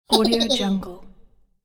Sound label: cheeky imp giggle